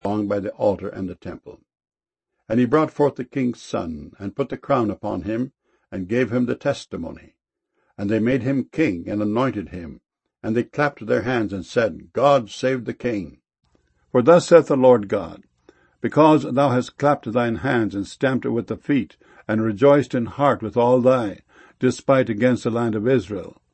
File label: clapped